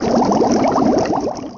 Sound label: Water Drown; A playing drowning in water; Zelda